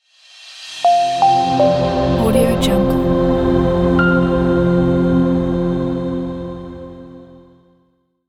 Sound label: ambient logo